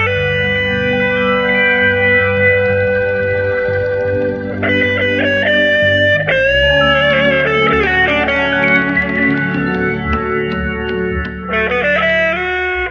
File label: guitar and keys, Phonky Loops